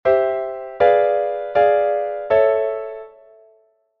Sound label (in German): Enharmonische Modulation C A